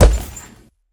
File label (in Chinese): 脚步声; 劫掠兽